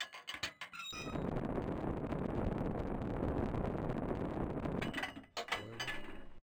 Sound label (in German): Feuerbox auf; vorne links; Sounddateien mit 5.1 Lautsprecher Kanalzuweisung